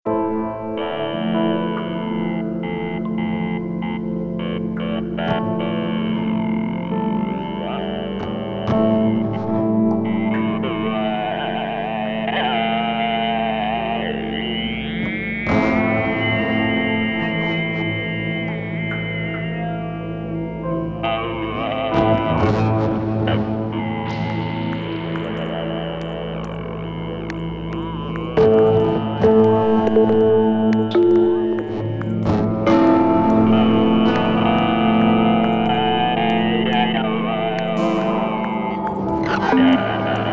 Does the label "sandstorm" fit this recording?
No